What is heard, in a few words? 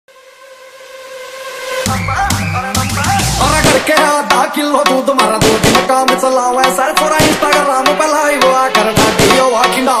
Dj Remix Bass Hard Vibration; Jbl Bass King Hard Vibration Panch